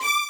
violin

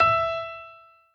piano echo

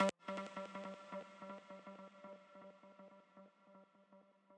arp

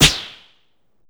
SE MAP BUTT; maimai

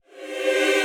choir

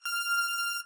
lead

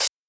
cymbal

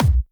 kick

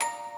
musicbox

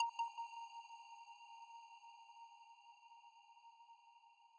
arp

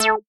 synth

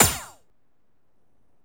SE GAME GREAT; maimai

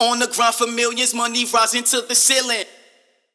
vox

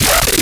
bass purge

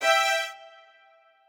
strings

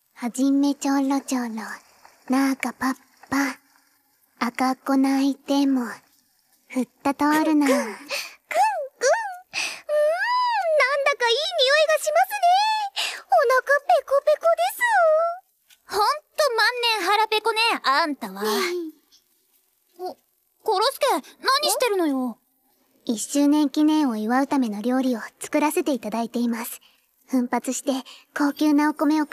Vocals; 公主连结; 1周年スペシャル映像 生放送直前篇

